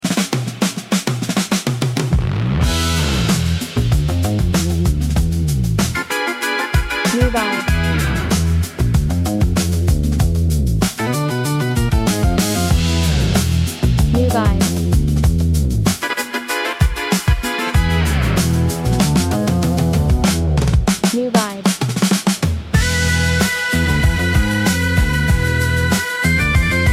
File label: 60s Rock, Genre: 60's Rock